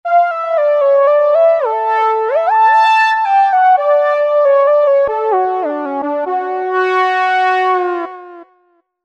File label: JP-8 Lead, Roland V-Synth; Factory Patches